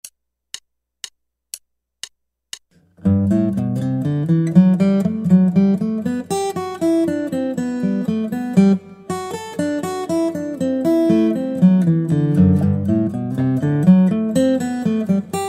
Guitar

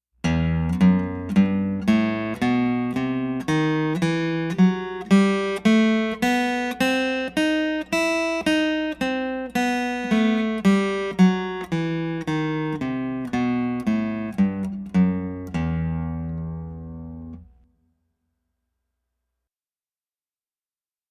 Two E Minor Scales

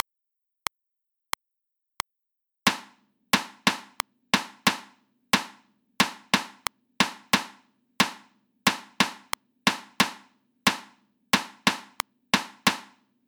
rhythms 16ths at 90 bpm

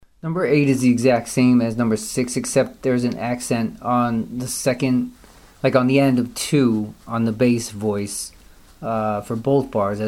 mozambique patterns

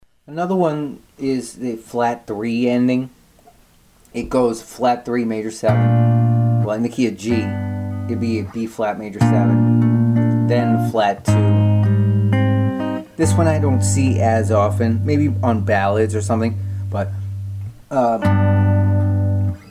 common endings bIIImaj7

